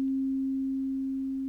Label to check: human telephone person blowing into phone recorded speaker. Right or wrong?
Wrong